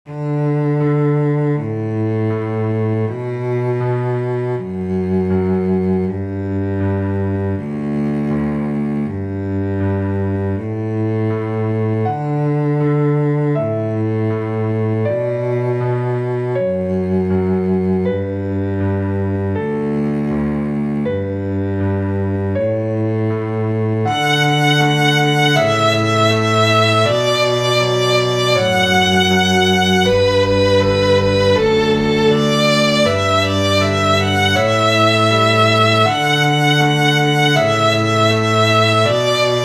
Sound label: piano cello 2 violins; Canon in D and Jolly Old